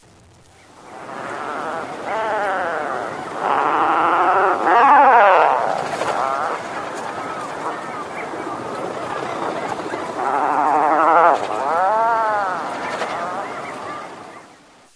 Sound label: Tufted Puffin